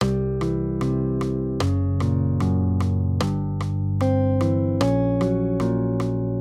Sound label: maj triads; C-Gb; accompaniment